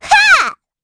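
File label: Vox Attack, Korean; Estelle; kingsraid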